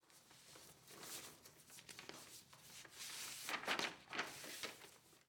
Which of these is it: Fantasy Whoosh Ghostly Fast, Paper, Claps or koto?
Paper